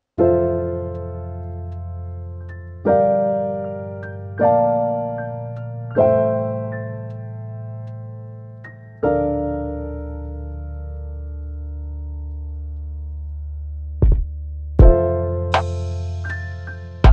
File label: Chill Relaxing Piano Lofi Beat